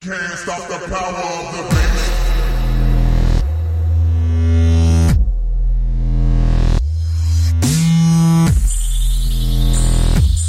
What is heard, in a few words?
DUBSTEP